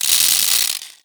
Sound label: 357 spin; weapons